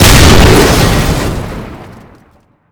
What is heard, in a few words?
heli hit; Call of Duty Modern Warfare